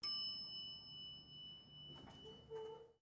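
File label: Elevator Bell Door Open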